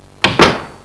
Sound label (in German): tuer